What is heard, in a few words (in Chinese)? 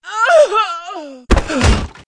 女 死亡 倒地